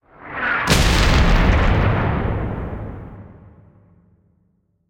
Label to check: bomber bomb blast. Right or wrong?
Right